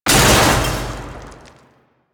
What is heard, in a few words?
Loot Crate Open; Objects; Narmer; DE; Warframe; Storage Container